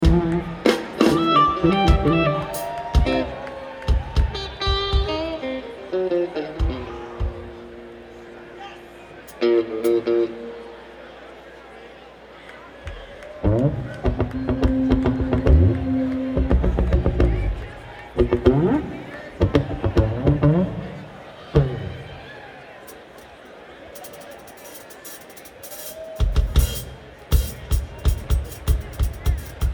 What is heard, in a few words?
Crowd